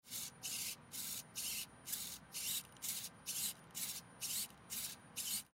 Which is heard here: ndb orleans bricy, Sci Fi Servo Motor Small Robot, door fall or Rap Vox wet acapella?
Sci Fi Servo Motor Small Robot